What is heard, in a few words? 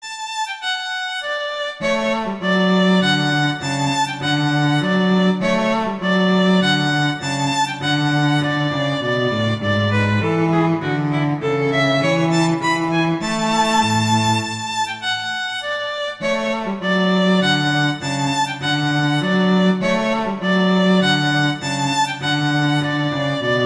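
Duet, horn; Mozart; Duets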